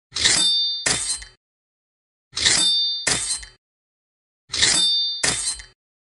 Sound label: Katana; کاتانا